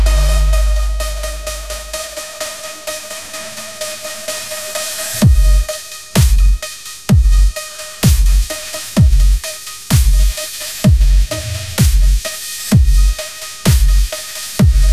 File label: epic bass drop symphony, ringtones